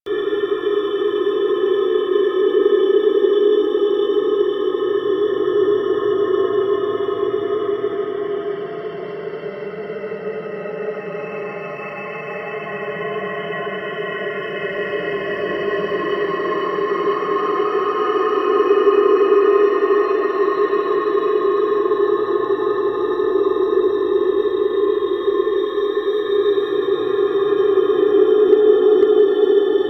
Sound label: Space Exterior Amb; Ambience; Space Battles; Warframe